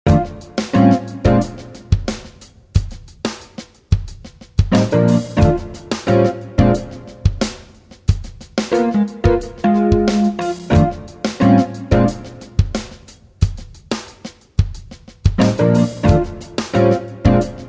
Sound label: Tribal